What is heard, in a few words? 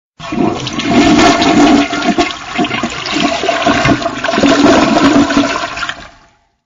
toilet flush